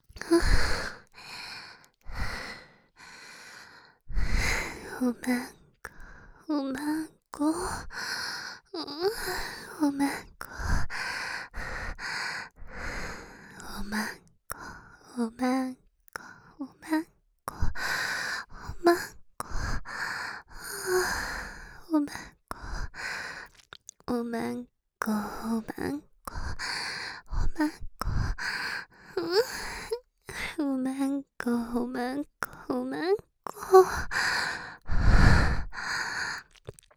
喘ぎ; お屋敷メイドのハーレム淫語ヘヴン 気が済むまで耳舐め淫語を堪能できるバイノーラルオナサポ音声

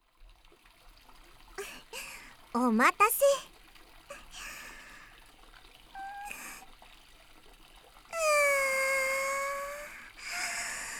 耳かき 海が広がる露天風呂にて, ASMR

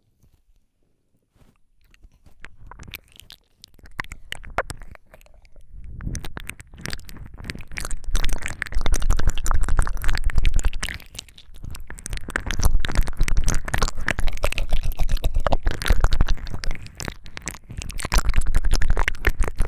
両耳舐め

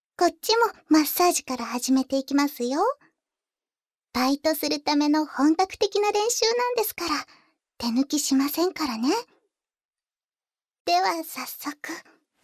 左耳のマッサージ&耳かき, ASMR

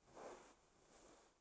布ズレ, 効果音

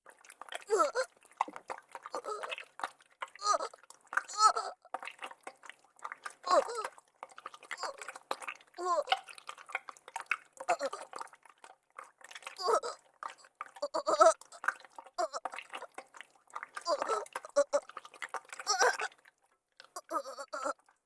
"お゛っ"

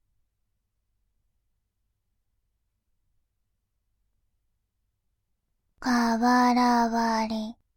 瓦割り, バイノーラル 左, ASMR

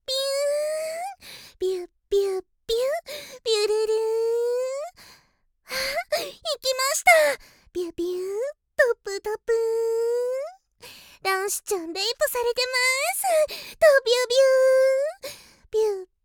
びゅ～～♥びゅっびゅっびゅっ♥

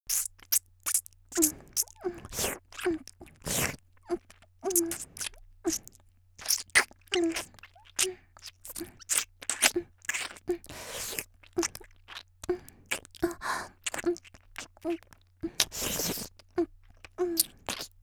「フェラ」ノーマル, 綾波系少女

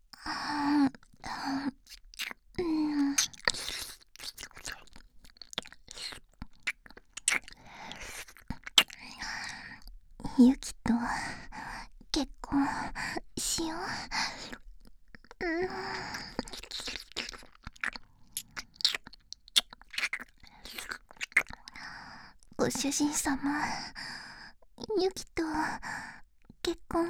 耳舐め

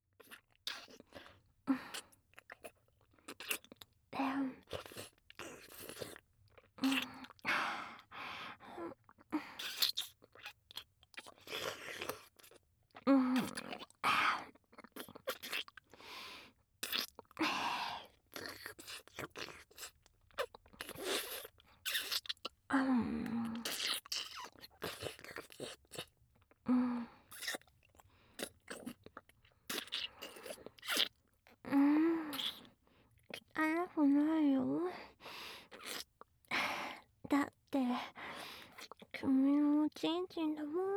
ASMR, フェラ; 反転

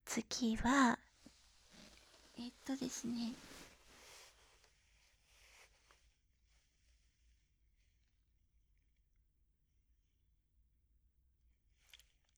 実演 バイノーラル

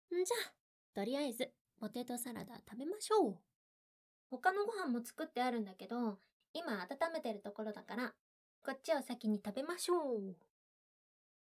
ポテトサラダむしゃむしゃ, ASMR

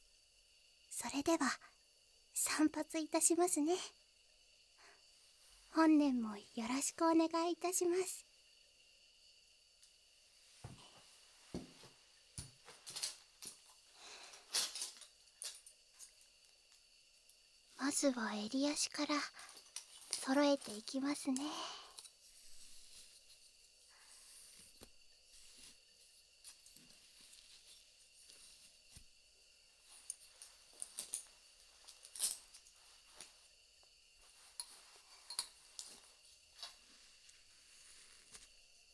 カット; 新装開店バーバーすずな

